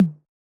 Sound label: Tom, Yamaha DJX 2B